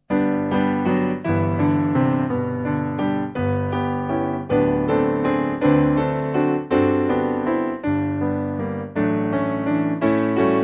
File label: Piano, Music Backing Files for Churches